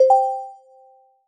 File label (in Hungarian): pipa